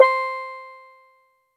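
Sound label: Steel Drum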